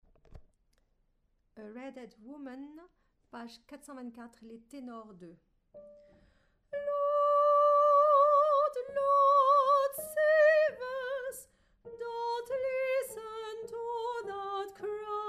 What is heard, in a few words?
Tenor